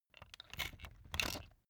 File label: puncher operate slow